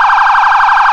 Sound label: police sirena; mtrp sirene